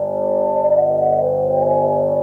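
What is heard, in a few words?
Ambience Sci-fi Energy Loop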